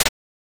Common invalid; itgmania

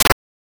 switch down; itgmania

